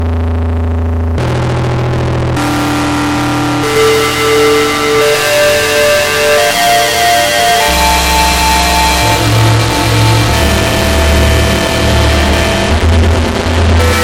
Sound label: RINGTONE